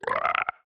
strider idle; Minecraft

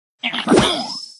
bomb skeleton atk

